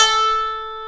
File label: MANDOLIN A 3E; AKAI S-Series CD-ROM Sound Library VOL-7